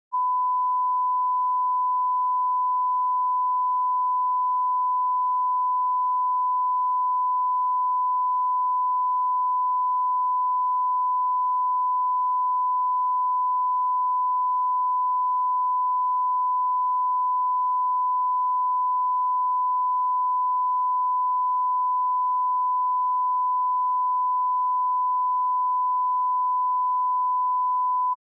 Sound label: 1001hz Sine Wave -20db L+R; Denon Audio Technical CD